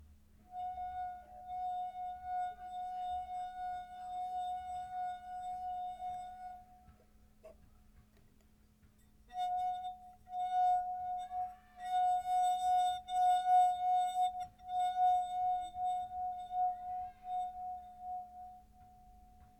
verre

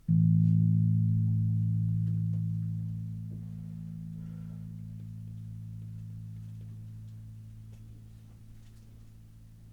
Basse la

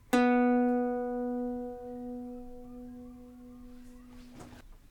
Gaucher si

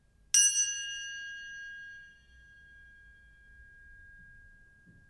cymbale A main 7cm5

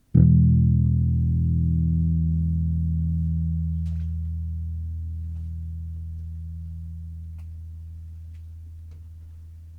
Basse mi

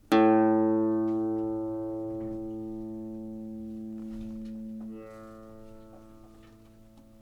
Gaucher la

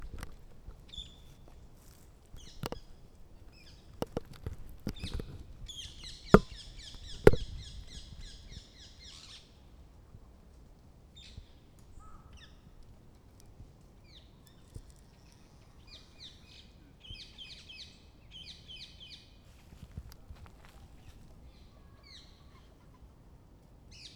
Poudrerie oiseaux; perruches

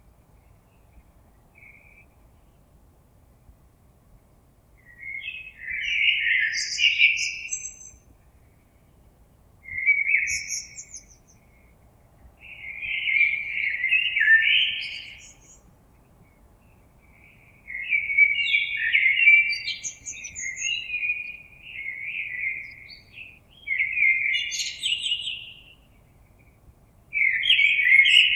Merle

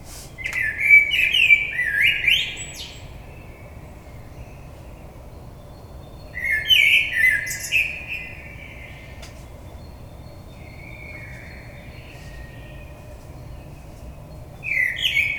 Merle

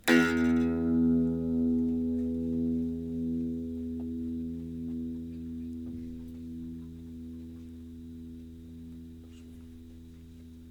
Electrique mi, guitare électrique stratocaster

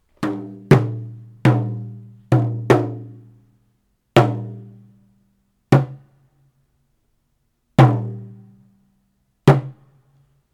Tambourin Naturel 25cm

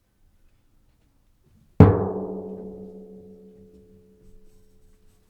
Repiques

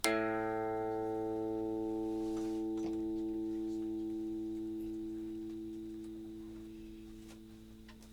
Electrique la

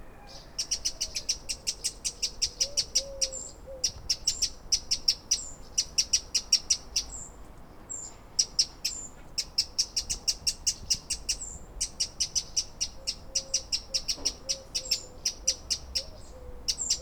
Troglodyte mignon

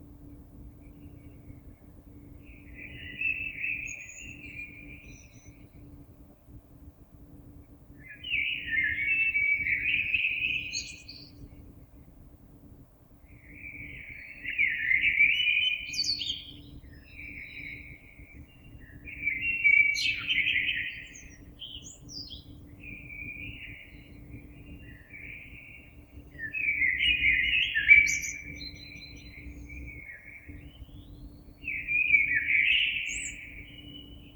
Merle

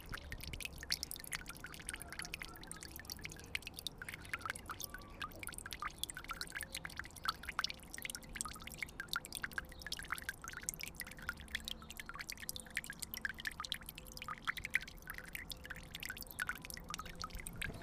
Bassin, oiseaux

